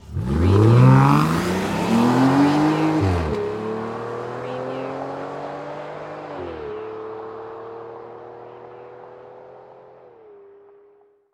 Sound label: Auto Aston Martin; 2008